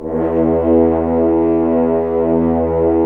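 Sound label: French Horns f, Dry; Roland L-CD702; F.Horns 2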